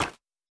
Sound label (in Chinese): 树脂砖破坏声